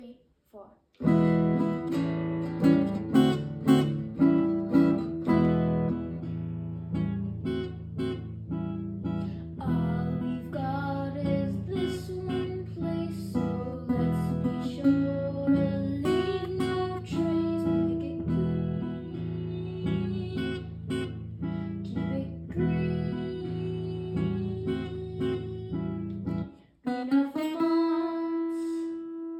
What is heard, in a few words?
jingle